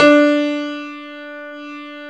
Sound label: piano D4, 2 Step; USB Soundscan vol.51; House Side Of 2 Step; AKAI; PIANOS